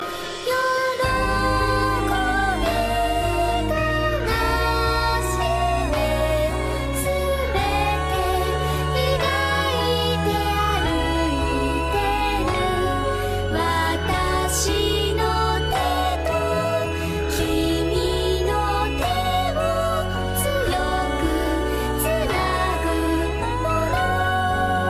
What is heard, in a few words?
song; Kobato